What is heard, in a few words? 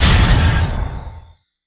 health orb stop; Original TK Sounds